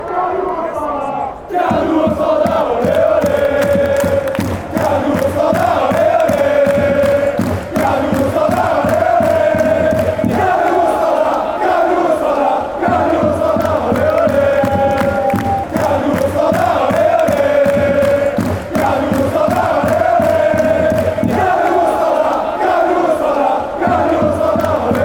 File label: ole ole, chants; candoasala